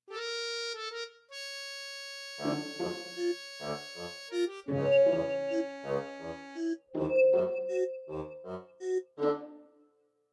Pirate Intro

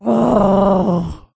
Beach Groan

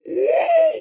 Raptor Noise

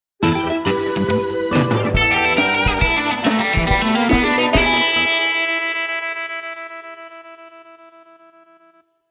reward

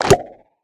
King head popping

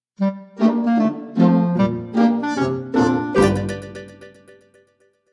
pirate victory

